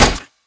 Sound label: turret drop; zmall; weapons